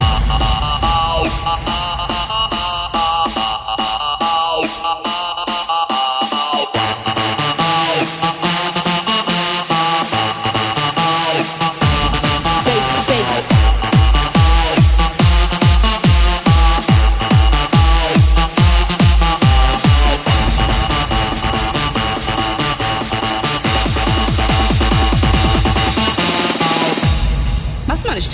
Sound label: Rythm Nd Bass